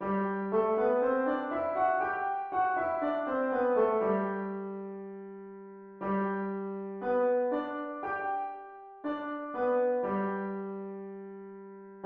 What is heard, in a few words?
g major piano